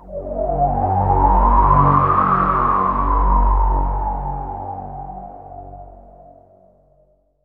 PROPHE FX, SYNTH FX; AKAI S-Series CD-ROM Sound Library VOL-6

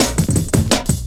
Step Ez Cut, drum loop, jungle, 168.5; Zero-G Jungle Warfare 1, 2 & 3

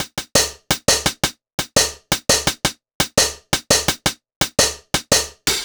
Hi-Hat, Hi-Hat Loops; KJ Sawka - Drum n Bass & Beyond; Drum Tool Box

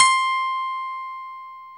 pop piano; Roland L-CD701; Pop Pianos 4; Pop Pno + P3

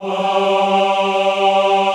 AHH G2, Classical Choir; Best Service; Extended Classical Choir

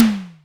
CONG TOM, CONGA BONGO; Best Service ProSamples vol.54; AKAI

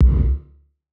Kick, Gated Reverb; musicradar

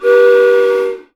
train whistle; Voice Crystal - Steve Reid Definitive Percussion; ASRT WHISTLE

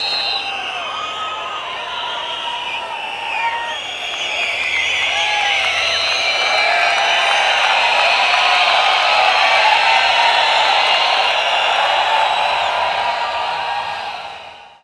APPLAUSE; Best Service - XXL Production Effects

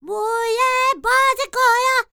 Vocal Planet World Voices, NOMADIC

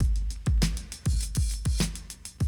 drum loop, 100 BPM

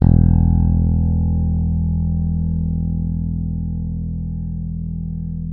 E1, FINGER MUTE; Xsample VOL-1

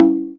CNGFUS, conga; Univers Sons; Basicussions

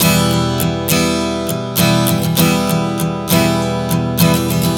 Strum 100 Em, One Chord Strumming 100 bpm

